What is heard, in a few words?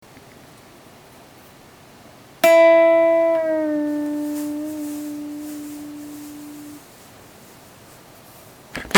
bend down